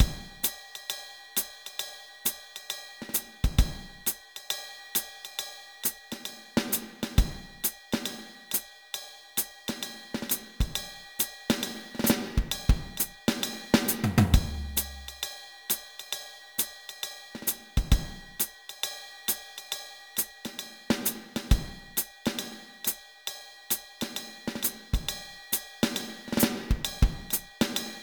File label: Spooky Attic Intro; MVDK2